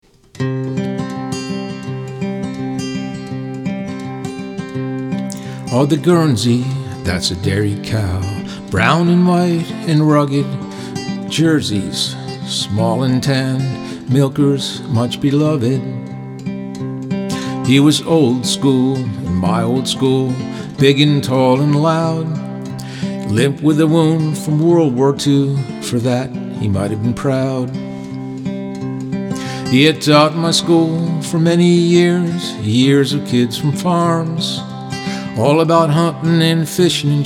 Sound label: cow song